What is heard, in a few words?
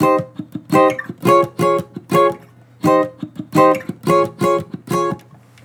Acoustic Chord Loop 170 BPM Ab Maj; Cymatics